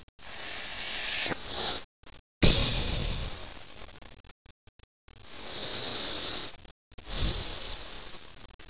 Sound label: Female breathe slow